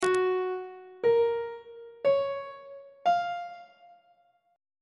a flat mixolydian 7th chord 7 is g flat major 7th on treble clef